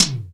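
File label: SP-12, drum machine; Best Service Gigapack 2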